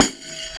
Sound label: EQ Perc, Percussion Mix